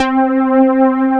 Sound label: pad C5, Roland, Analog; USB Soundscan vol.49; Analog Fantasies; AKAI